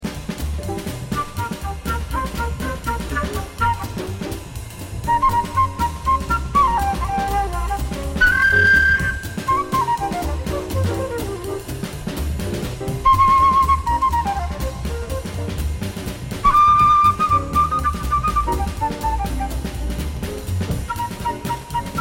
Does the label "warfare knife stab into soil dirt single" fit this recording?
No